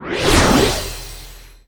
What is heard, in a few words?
Enemy Underground Active Hide; disney star smash android ios mobile gamerip 2020